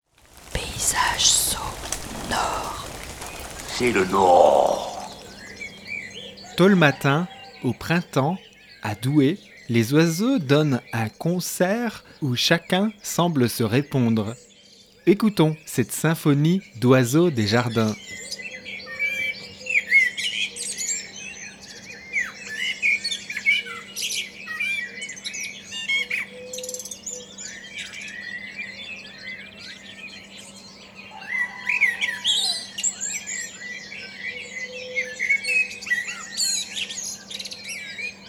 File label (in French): Oiseaux des jardins; DOUAI; Hauts de France; PAYSAGE SO NORD